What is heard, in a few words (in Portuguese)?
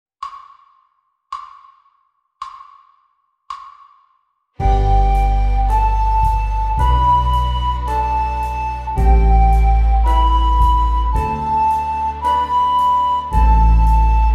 Flauta Doce Estudo; licoes para flauta doce